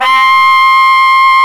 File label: SAX T. GROW, SAX Tenor; Tenor V-sw